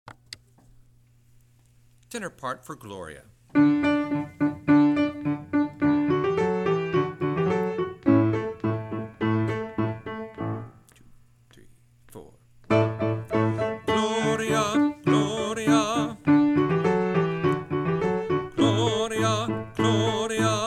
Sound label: Tenor